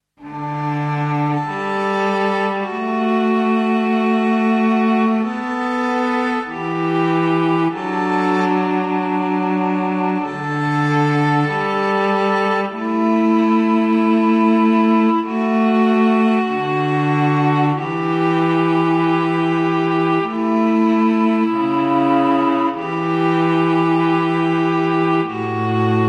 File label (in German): streicher